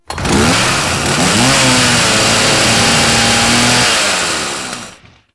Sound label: Saw Idle Rev; Warframe; Kuva Ghoul Saw